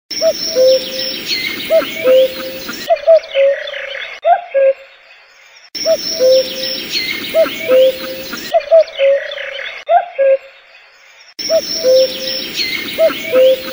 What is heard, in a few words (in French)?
Chant du coucou Cri du coucou